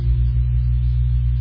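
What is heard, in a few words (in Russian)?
гудение не работающего телевизора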